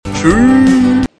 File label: SIUUUU